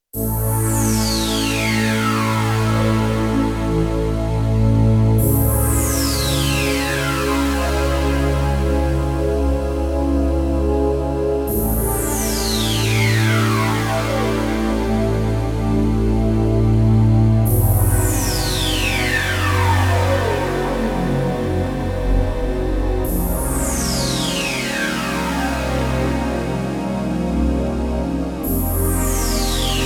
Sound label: Roland JD-800 Classic Sweeper; Factory Patches